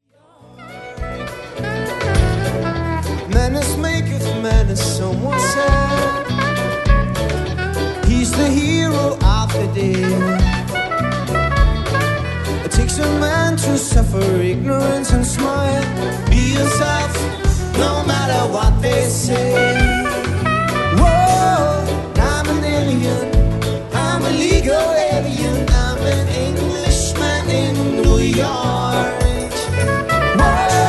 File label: sax solo